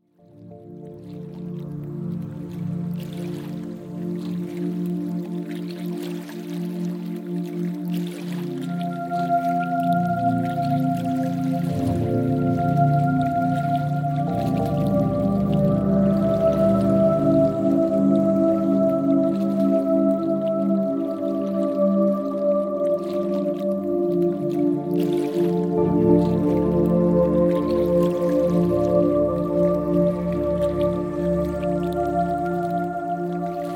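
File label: nature sounds for little ones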